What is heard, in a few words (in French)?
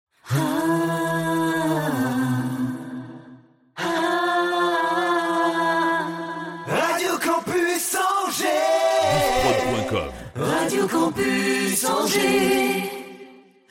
Drop Chante